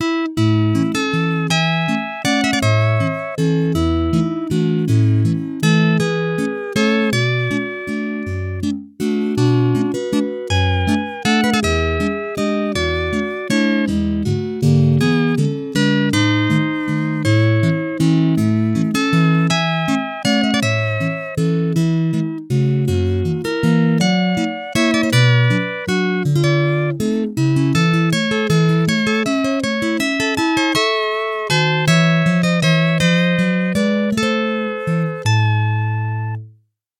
Petite valse (busker version); intradiegetic

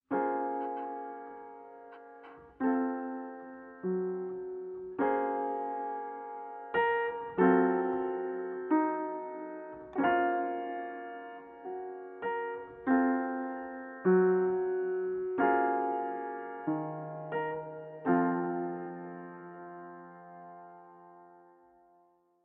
tune

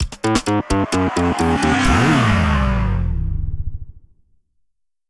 jingle

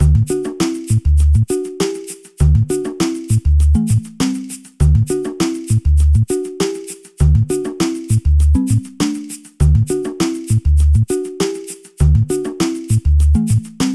menu

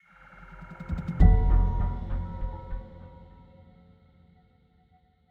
death; freeflight

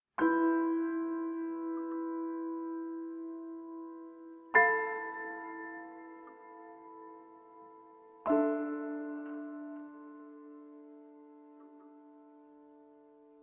tune

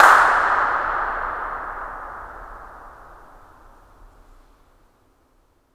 incinerator dirac

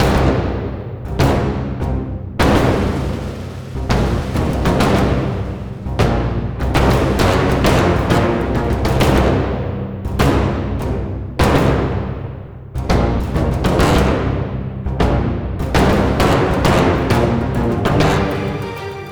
combat

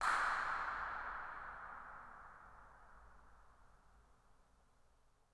incinerator dirac

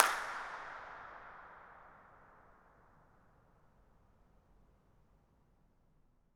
incinerator dirac

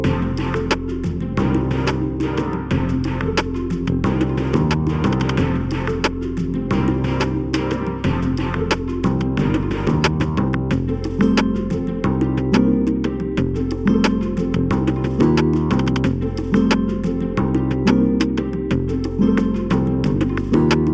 bgm

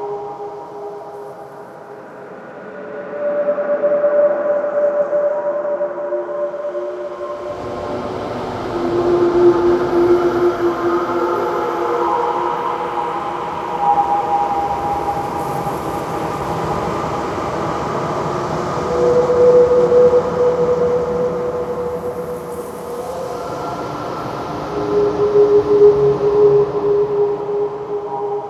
ambiance; DS school project game

